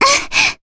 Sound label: Vox Landing, jp; Cassandra; kingsraid